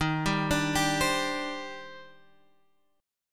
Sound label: D sharp Major 13th D sharp, chord